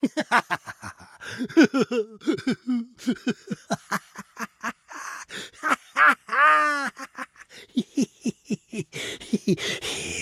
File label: Attack grunt; We Happy Few